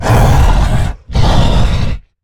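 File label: Stealth Attack; Evolve; Goliath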